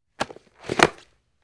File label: Football Helmet